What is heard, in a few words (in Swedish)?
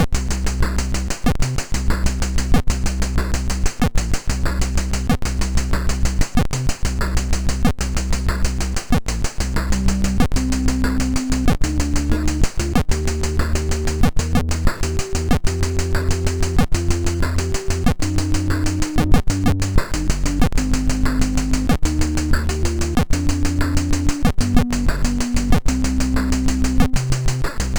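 MOS 6581 SID chip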